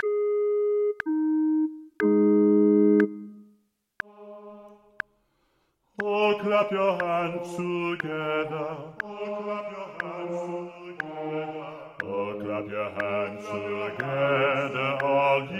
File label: Rehearsal B1 B2; Gibbons